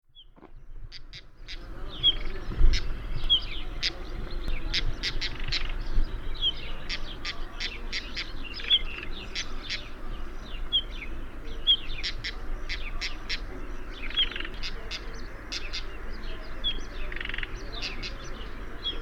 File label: Oenanthe melanoleuca call, birds of the ussr; passeriformes; muscicapidae